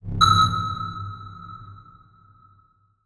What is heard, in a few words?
Beep Low Pitch; Multimedia Internet CD-Rom Flash